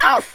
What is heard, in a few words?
oof, Scotsman; speech